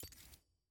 service status button click off

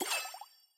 ui fanfair dialogue finish

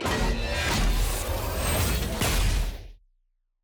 loot open promotion outro

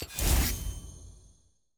button open; champ select; chromas

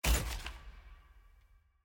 sfx ui collection artifact click; caitlyn

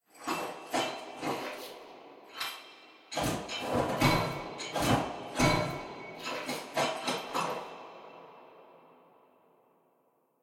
walk look out window phase

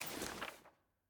sfx ui record next click; jayce

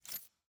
chat window close

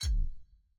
emotes remove; emote panel

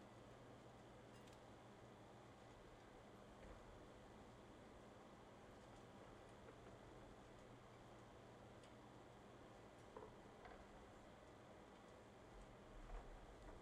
sfx amb collection; caitlyn

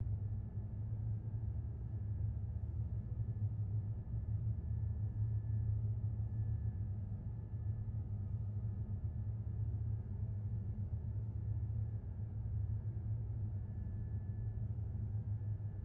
amb throne

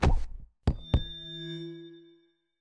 karaoke; tft; ceremony; asol

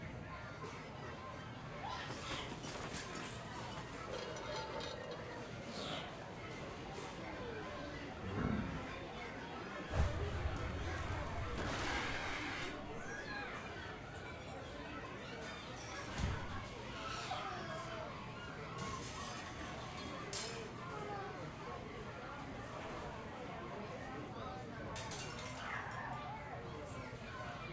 amb penthouse; tft

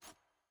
sfx ui generic section microfiche hover

